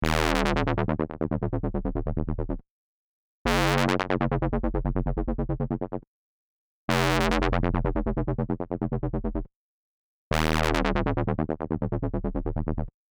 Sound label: Synth Bass